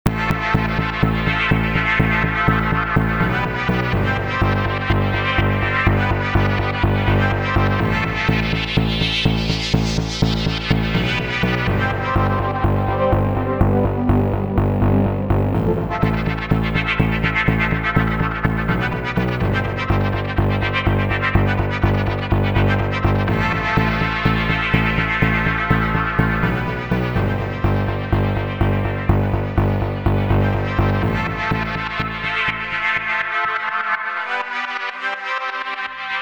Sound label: analog brass winds